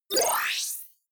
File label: screen turn on bubble; energie